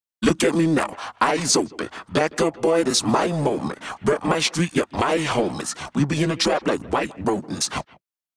Double On Lfo Off

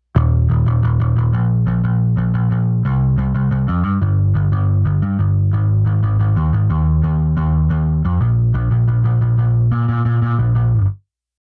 Bass On

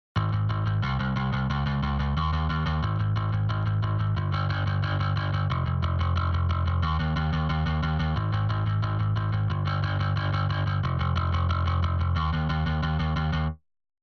bass On

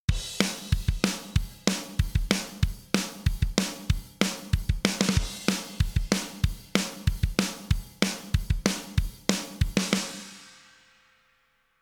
drum On

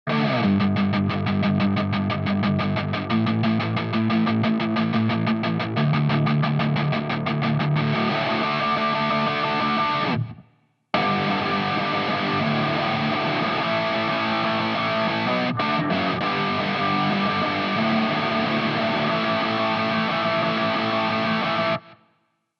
guitar off

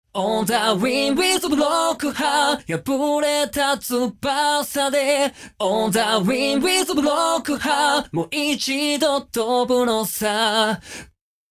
Synth V On

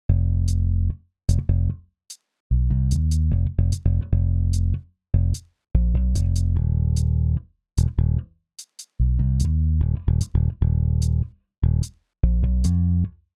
bass off

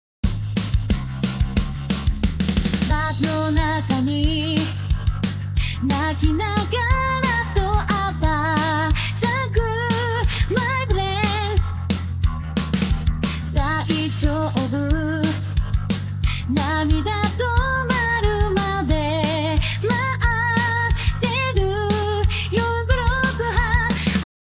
guitar off

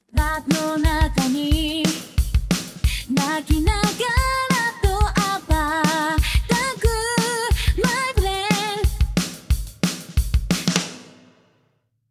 drumer

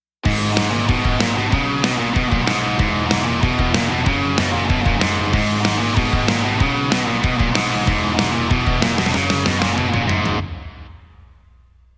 drum On

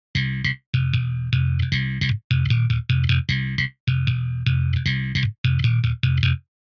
bass on

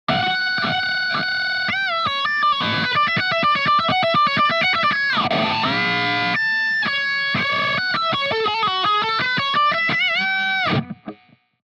guitar wah on

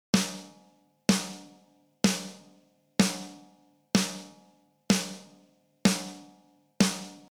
snare smash

